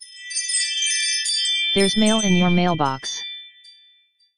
ring mail in mailbox

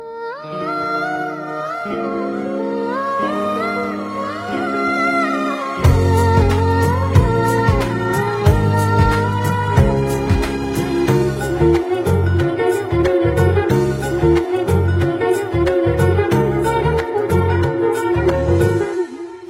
Romantic

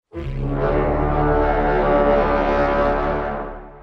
Warhorn; Ice Age 4

